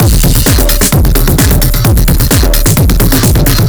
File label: Distorted Drum Beat 130, distorted drum loops 130bpm; DD Beat DOA